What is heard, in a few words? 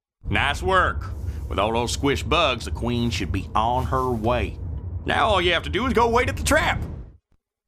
Miner hives done (cinematic)